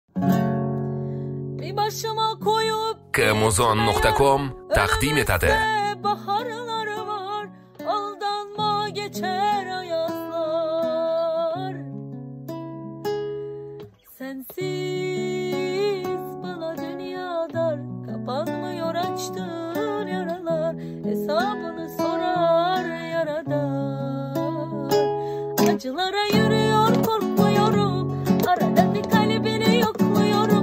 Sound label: guitar